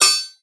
blacksmith, forge, hammer, anvil, strike, loose, ringing, splash, iron, thin; Ilmarinen